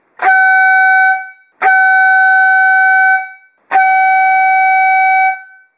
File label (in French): son klaxon petit camion bateau; kalxon petit camion bateau mdk 625